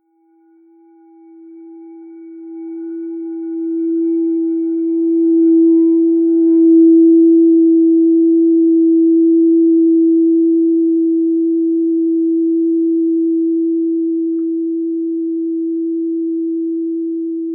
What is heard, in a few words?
10 e note 440 hz perfect pitch solar plexus bowl